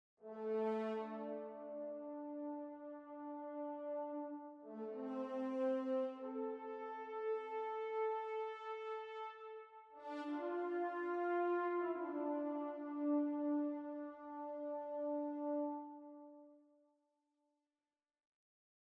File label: travel stormlands; Mount&Blade Warband